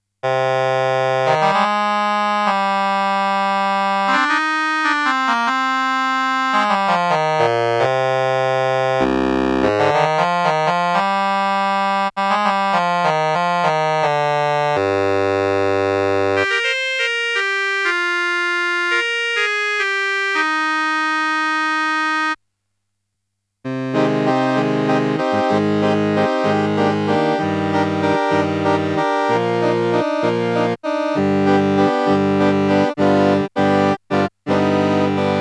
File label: Casio MT 400V Oboe Accordion